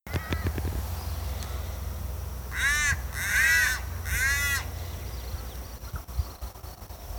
Cuban palm crow; کلاغ نخلی کوبایی

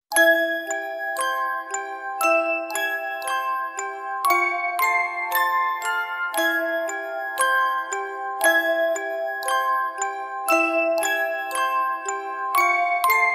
Creepy music box

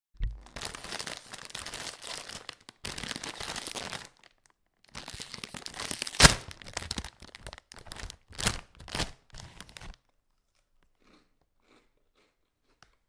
Pack of chips

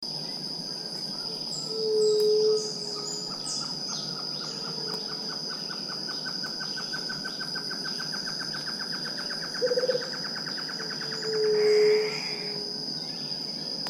White tipped dove, قمری ورو

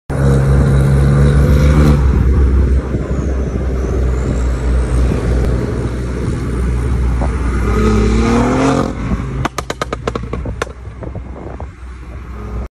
BMW i8; موتور

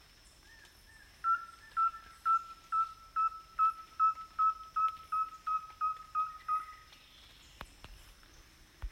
Rusty belted tapaculo

